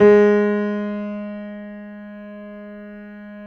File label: JAZZ G#2, Keyboards Acoustic; USB Soundscan vol.17